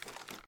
reload fast lift; gmod

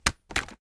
reload fast end

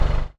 stop, vehicles

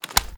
reload empty xmag grab; gmod

